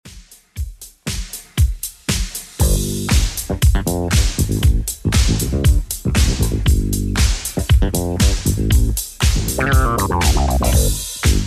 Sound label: 80s Redrum 118 bpm